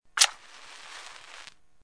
cerilla

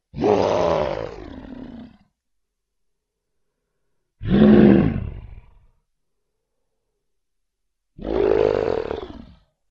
animales salvajes

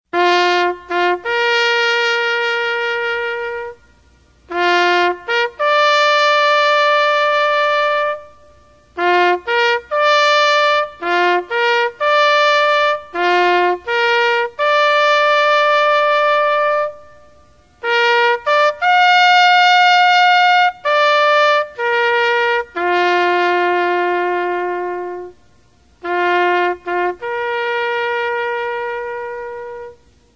trompeta funeral entierro

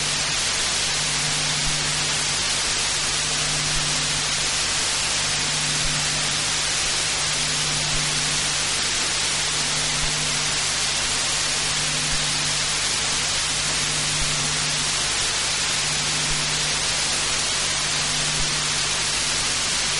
coro

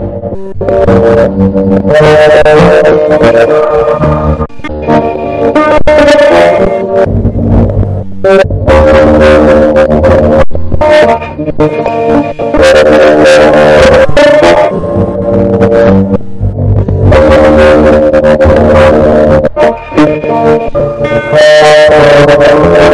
distorsion

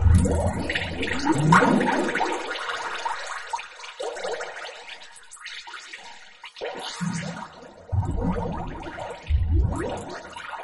peine

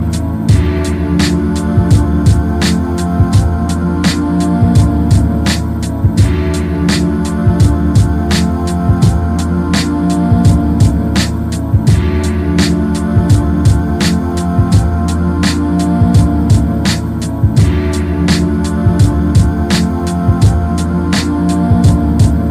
base hip hop

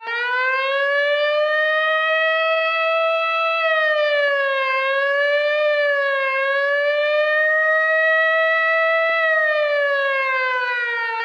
sirena; sheriff

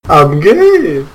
ayy ayy

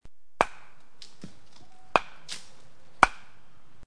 Bate golpeando

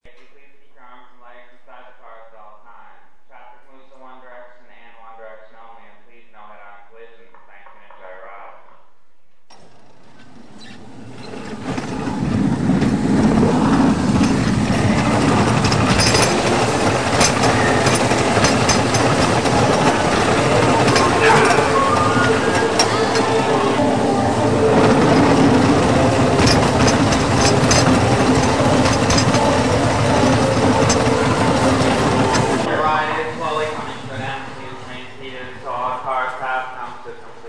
coches chocones

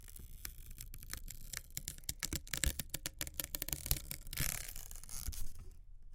rompiendo

